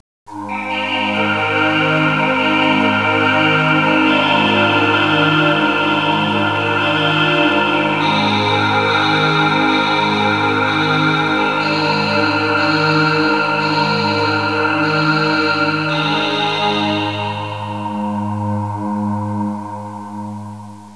audio suonerie musica mistica

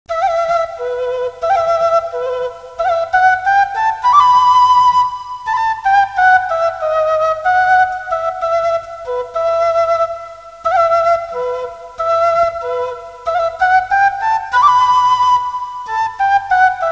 musica strumentale

